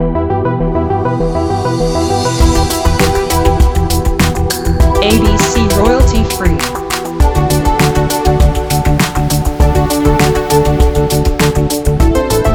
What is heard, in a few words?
Electro Ambient Chillout shorter